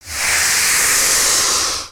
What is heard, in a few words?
Pets Tortoise Angry; creatures; Lego